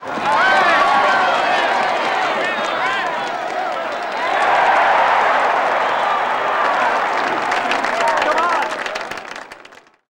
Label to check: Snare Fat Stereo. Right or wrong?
Wrong